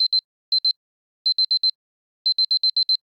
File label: Alarm Beep; alarms